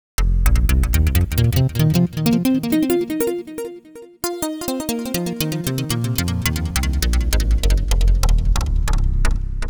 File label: deep delay fx bass